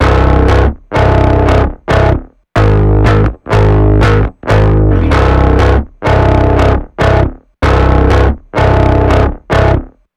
bass DI preset on; df-xcite 2; drumforge

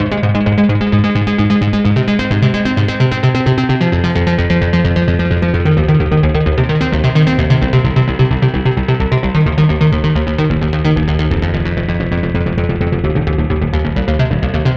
synth bass wet with airspace; airspace hybrid reverb and delay; modeaudio

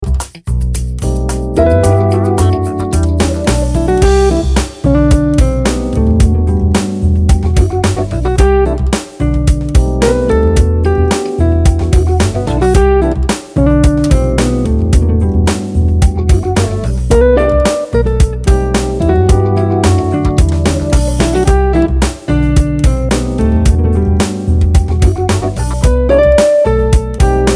smooth jazz guitar; audiolounge soft

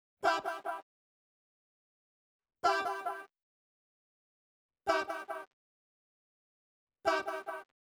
vocal stabs cheap ping pong; hofa plugins

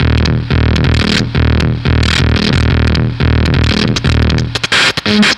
drum preamp, Fuzz test

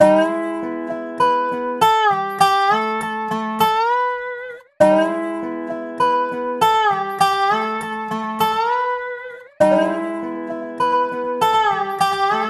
acoustic guitar; septimbral

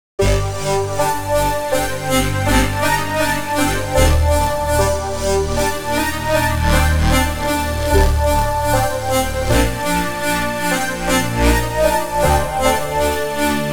azure seq; the space soundset

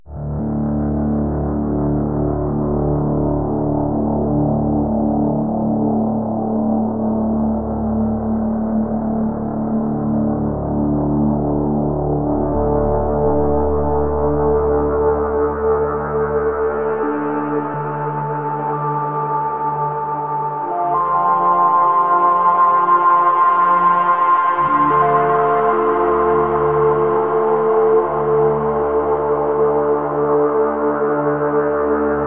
outer reach; porphyra hybrid by ocean swift synthesis

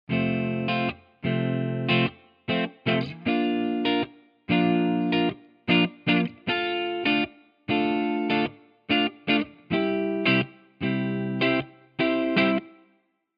les paul wet; chiqui audio

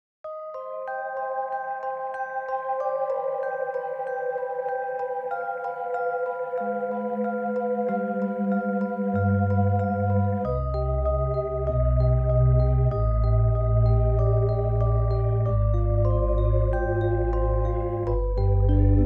glockenmaschine; schallenberg engineering

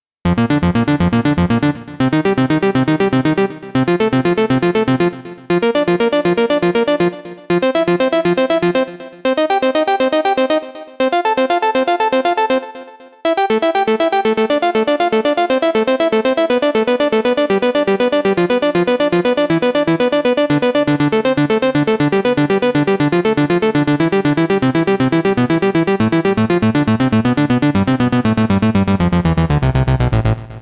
arp rhythm; the transmitter soundset

